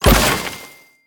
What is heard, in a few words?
Pickup Box; kart racing; Boom Karts; Android